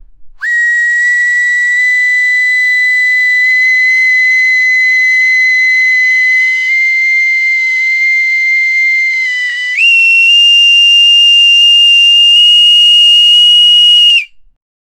a steam whistle made of, creature

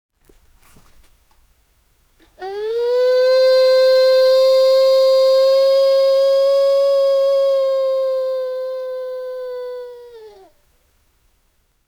cow mooooooooooo; barn

